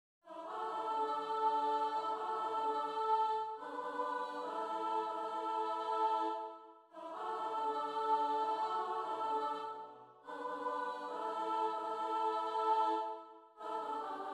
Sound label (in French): Soprano Alto